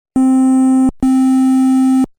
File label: triangle